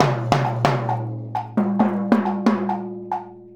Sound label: Wood Block and Timbale